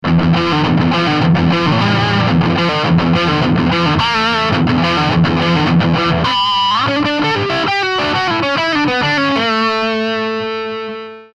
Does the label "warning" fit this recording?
No